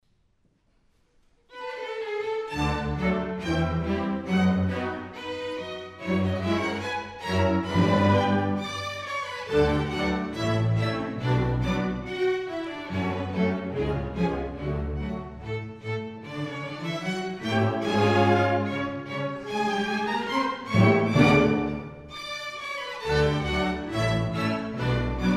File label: Classical; Prodigy Mendelssohn; III. Gavotta; Silicon Valley Symphony